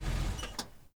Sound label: vehicle pickup turnoff; teardown; ps5 windows xbox series xs